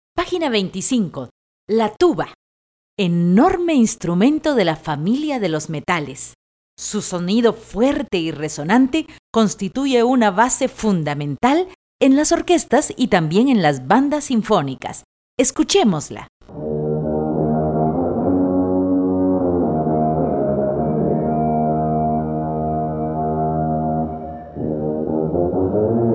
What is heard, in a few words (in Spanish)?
TUBA; La tuba: Ensamble (Frag.)